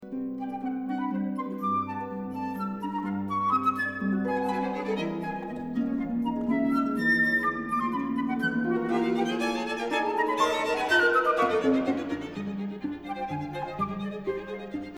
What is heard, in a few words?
Capriccioso Fast